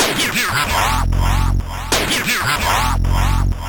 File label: beatbox; Pinball Dreams